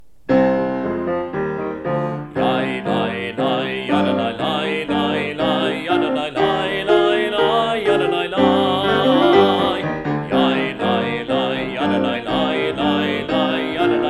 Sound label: Ein Keloheinu